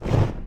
ignite; soundspvz

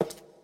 tap; soundspvz

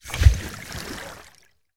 zombie splash; soundspvz

